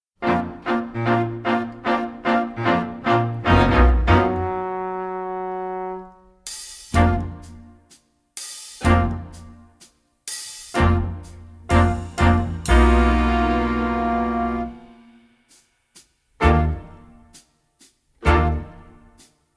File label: Instrumental